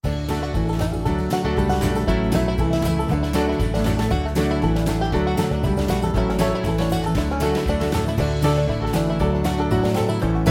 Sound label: Instrumental